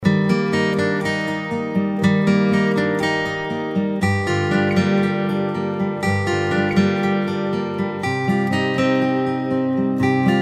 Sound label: stunning tone